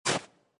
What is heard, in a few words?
Body hit; iStunt 2